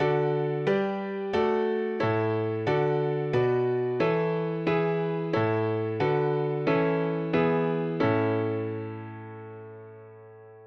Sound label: Common Chord; MusicTech; ICTheory; ModType